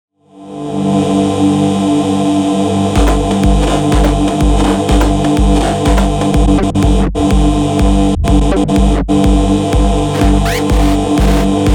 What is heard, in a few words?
pad; influx pro; fkfx audio